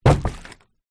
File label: bottle water large down hard on surface; foley